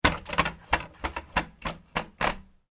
animals cat through flap; Cat Going Through Cat Flap; Cats; Cat Flap 1; McKinney Sound

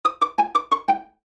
Pizzicato Strings Accent Short Chromatic Descend; Cartoon Music; Blastwave

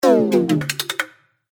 Android - Mechanical Parts, Robot

